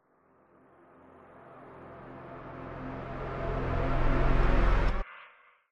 Attack Crescendo; horror and atmosphere fx; Silent Hill Downpour

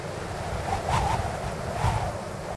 Wind Gusty Loop; weather fx; Silent Hill Downpour

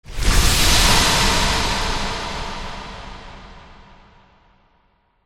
Fall Whoosh; horror and atmosphere fx; Silent Hill Downpour

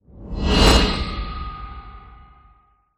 Iron Impact Heart Attack; horror and atmosphere fx; Silent Hill Downpour

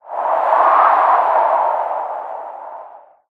Wind Gust Loop; weather fx; Silent Hill Downpour

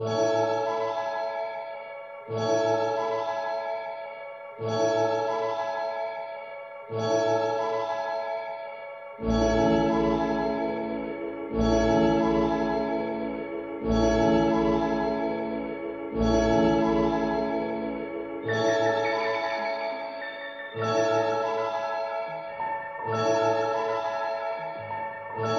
Sweet Chimes; by aethryix

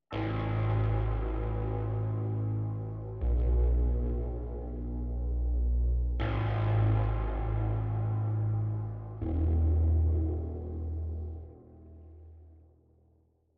Door Stinger; Silent Hill Downpour

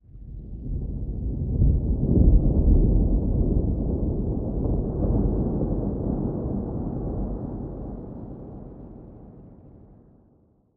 Thunder Layer Loop; Monastery; Silent Hill Downpour

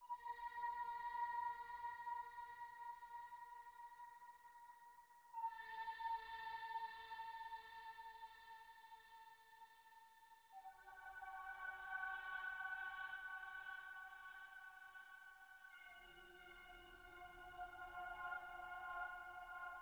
Radiostation Explore Loop; Silent Hill Downpour

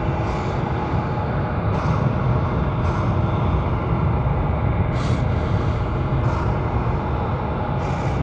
Prison Ambience Loop; Prison OTW; Silent Hill Downpour

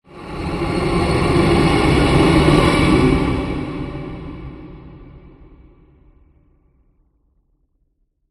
Ghost; Silent Hill Downpour

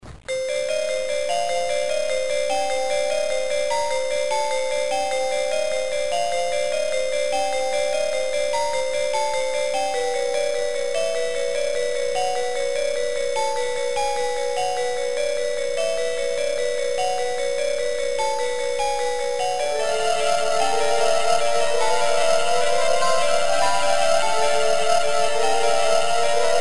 Dark Corridors; sh play novel; by james lennox

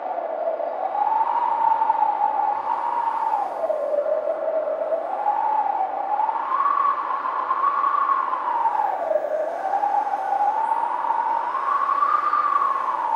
Window Draft Loop; weather fx; Silent Hill Downpour

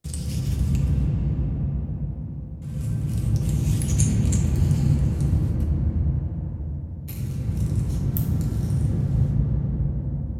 Diner Amb Cliff Gap Loop; horror and atmosphere fx; Silent Hill Downpour

